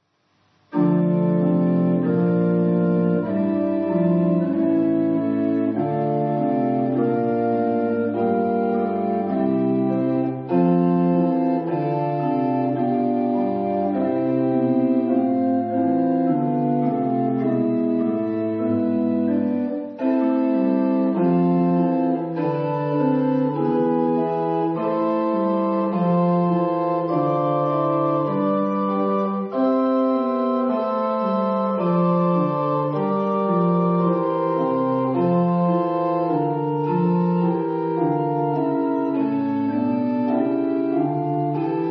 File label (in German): Präludium D Moll Orgel; Nickel Ekkehart; Rabenau